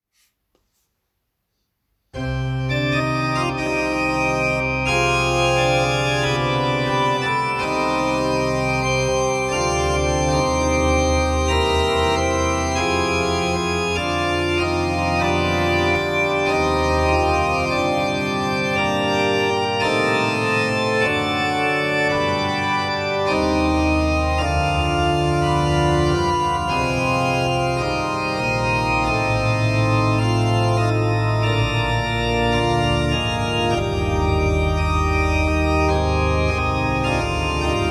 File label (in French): Plein Jeu; Louis Marchand